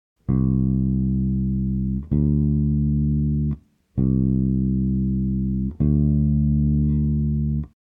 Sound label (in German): große Sekunde